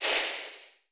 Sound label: defuse